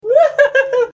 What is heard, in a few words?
wuhuhwuhuhu